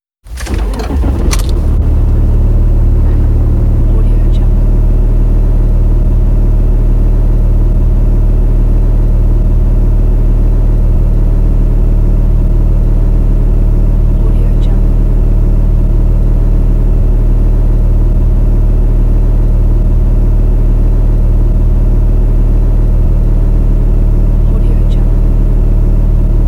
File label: starting car